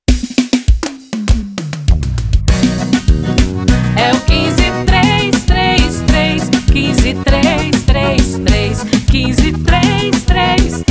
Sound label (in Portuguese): jingle forro; material de campanha